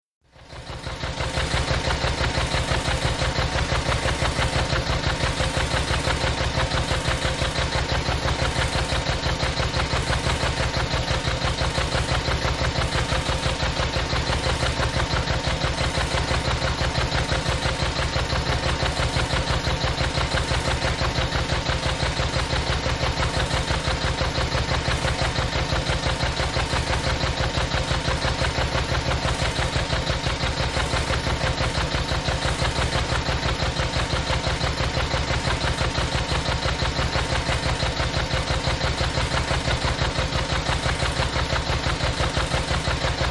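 1 Cylinder Engine Constant Run, 1940s 1 cylinder lister engine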